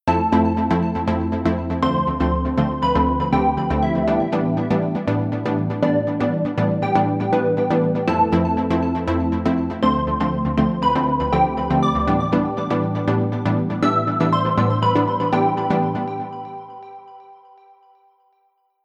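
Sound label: Synth Pluck melody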